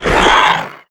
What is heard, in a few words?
grunt, antlion; creatures; vox